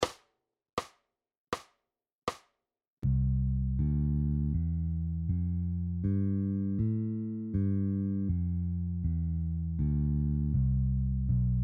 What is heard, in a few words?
bass major scale open position at 80 bpm